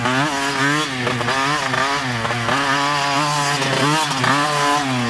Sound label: roost